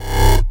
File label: Basses Root C